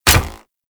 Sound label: Shot; Warframe; Weapons; Infested; Inf Cernos